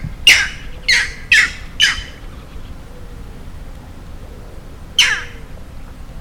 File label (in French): Héron strié